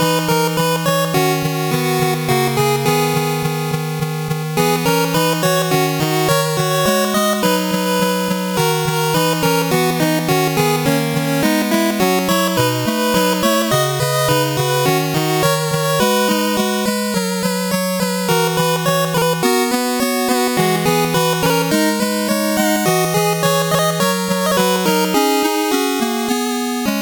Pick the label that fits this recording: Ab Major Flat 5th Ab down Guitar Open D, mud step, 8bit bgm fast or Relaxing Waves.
8bit bgm fast